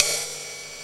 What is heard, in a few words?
soundlab ride, drum machine; 300 Drum Machines; DrBöhm